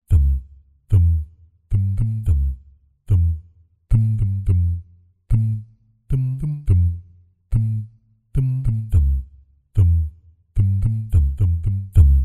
Thums, Jazz Bass Multisamples; ILIO - Vocal Planet VOL-6

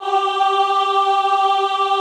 AHH G3, Extended Classical Choir; Best Service

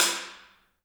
HAIRCUTS, KENYA DRM, Heart of Africa; Spectrasonics

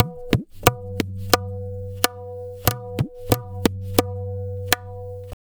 tabla, 90 bpm; Zero-G; Pure Tabla

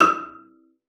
CELLO FINGER; 90 s Sample CDs; Xsample VOL-5

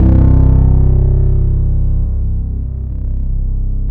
BASS, 140 BPM; Ueberschall; Drum & Bass Resonance

